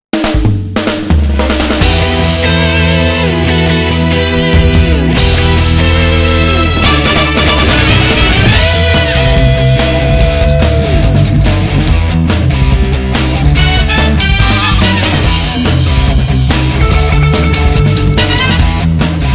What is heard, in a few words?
karaoke